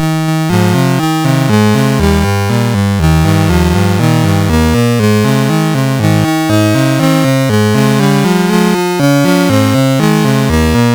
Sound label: Happy Birthday, MOS6581R2